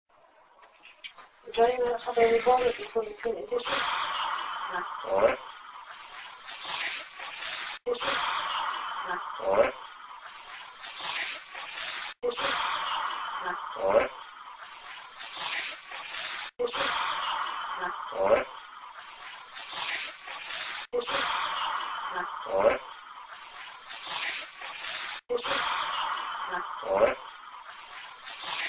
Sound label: heavy sigh